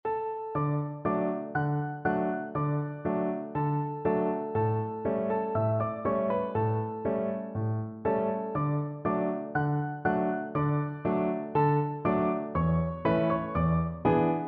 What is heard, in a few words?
schubert trout, piano duet